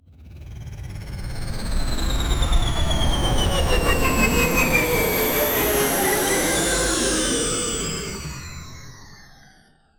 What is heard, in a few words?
spaceship moving into, vehicles